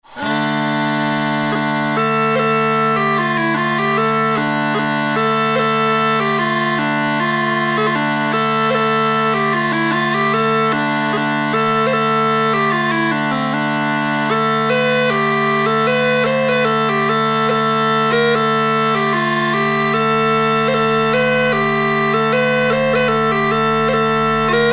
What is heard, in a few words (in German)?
Hümmelchen, Dudelsack